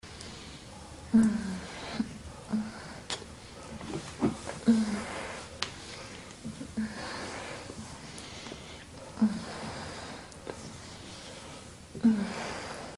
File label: indoor; Sexy Witch 2 The Bungler and the Witch